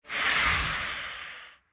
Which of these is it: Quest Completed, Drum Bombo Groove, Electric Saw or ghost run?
ghost run